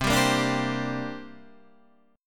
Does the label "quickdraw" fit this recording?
No